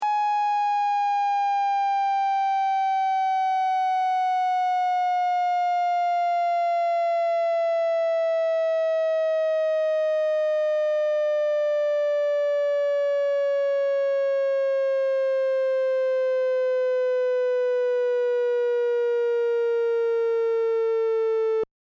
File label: Oct Ramp Down